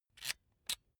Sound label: electric drill template slide in slow; maschinen; bohrer; soundpool